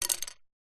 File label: household chopsticks set down on table